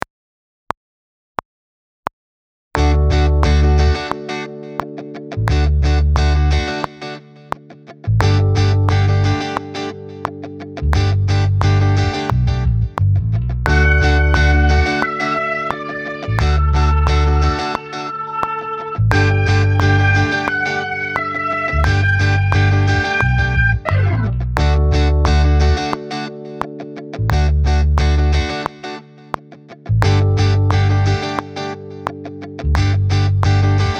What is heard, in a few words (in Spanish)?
loop ritmo de corcheas; Cancion 8